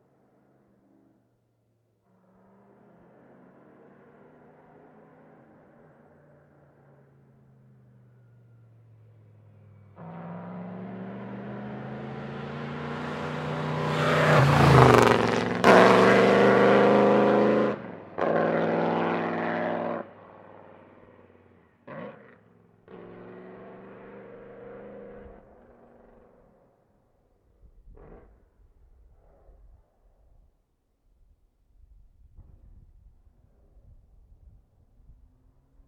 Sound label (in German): Mercedes-Benz W125 von 1934 am Grossglockner; Vorbeifahrt am Grossglockner